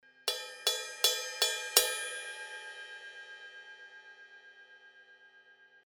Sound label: 19 I Crash bell hits, cymbal